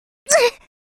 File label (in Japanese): 被弾（空中）「ううっ！」